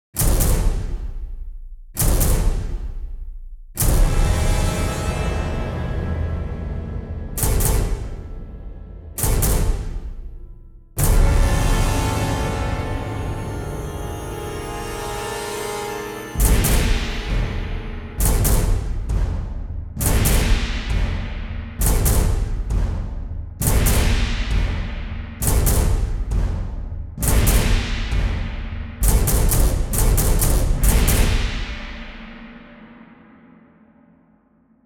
Percussion; ProScores

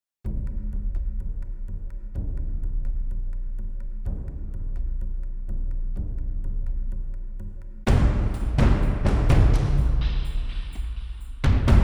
Percussion; ProScores

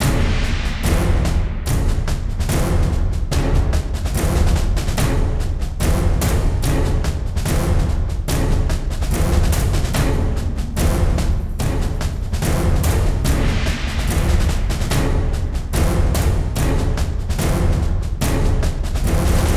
March Percussion; ProScores

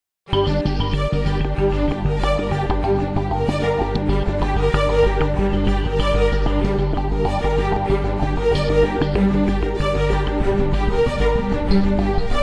Stings Synth; ProScores

